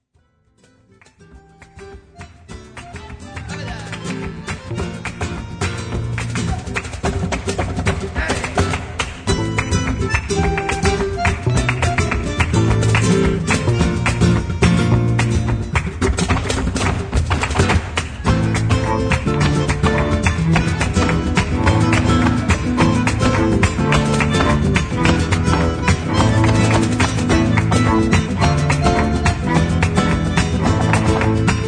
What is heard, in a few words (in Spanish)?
Farruca